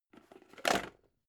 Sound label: plastic bowl stack